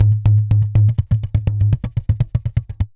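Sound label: tantango groove; Best Service; World Colours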